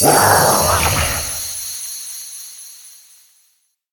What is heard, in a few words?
salt